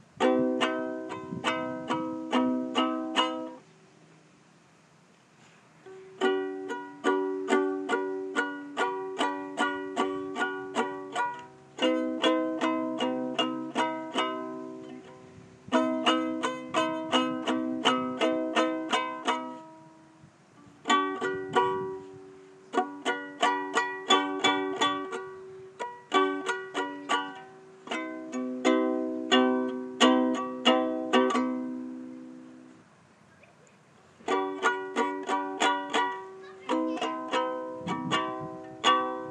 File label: ukulele